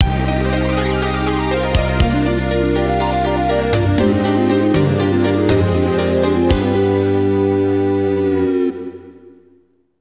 Game Over (GS)

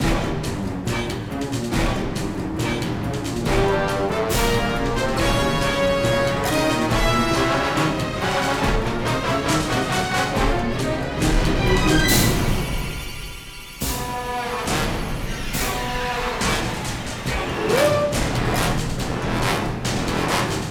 Combat

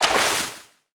Water

